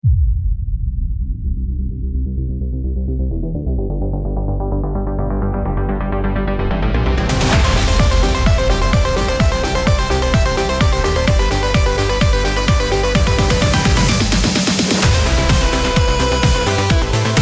final boss, music

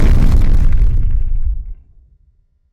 player explode; Sfx; freepixel; Tilter

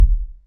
wall hit; Sfx; freepixel; Tilter

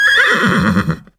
horse neigh

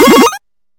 base hit, tower defense; freepixel

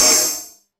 snake hiss; Creatures

